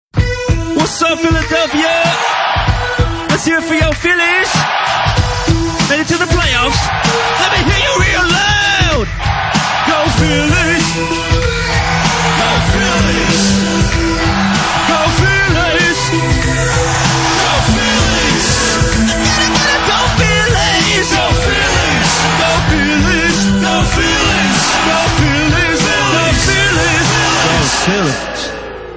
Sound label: hip Hop